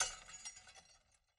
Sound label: break plates; angband